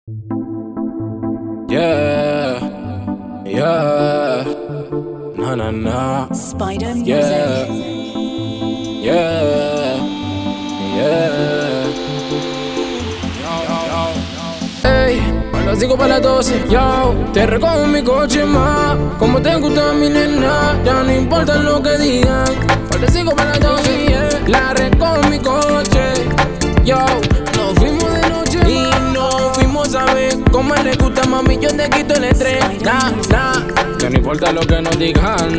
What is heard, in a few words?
Vocal Garage Mix